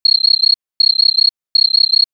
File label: crickets